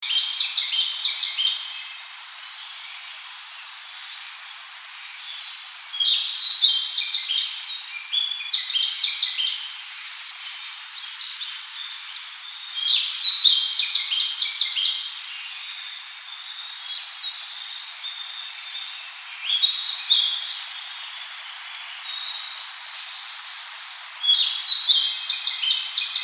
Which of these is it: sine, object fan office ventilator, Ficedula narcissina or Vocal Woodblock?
Ficedula narcissina